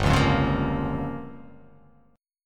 G Minor 7th Flat 5th

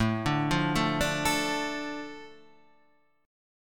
A Suspended 4th Sharp 5th; Asus4#5 Chord {5 5 3 2 3 5} arpeggiated

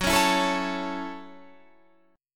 F sharp Minor 7th F sharp

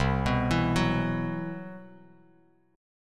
C Major 7th Flat 5th C, Bass Standard; CM7b5 arpeggiated; JGuitar

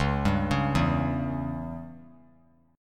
Db Diminished Db down; arpeggiated; JGuitar

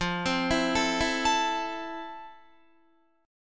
F Major 7th F, Guitar; arpeggiated

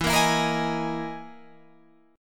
E Major 7th Flat 5th

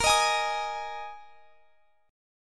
Bb 7th Suspended 4th Bb; strummed

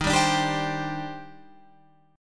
D sharp Major 13th D sharp Guitar Open F, chord; D#M13; strummed

